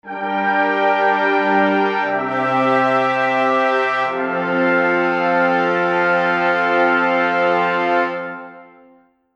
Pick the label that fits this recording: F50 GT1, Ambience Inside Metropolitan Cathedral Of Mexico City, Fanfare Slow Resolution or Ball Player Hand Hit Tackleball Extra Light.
Fanfare Slow Resolution